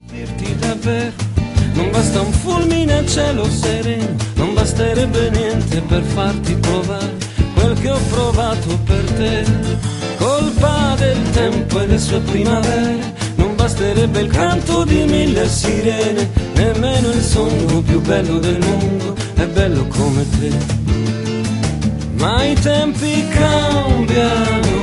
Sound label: tango